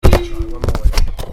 scratch

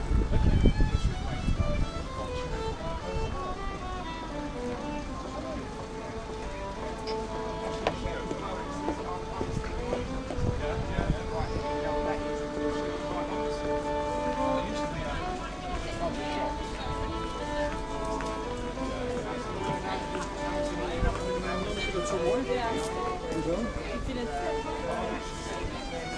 outside boots

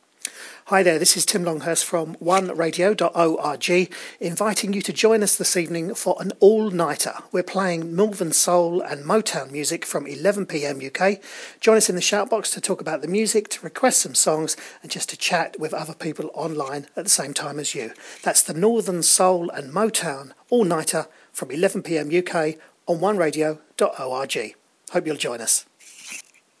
northern soul and motown

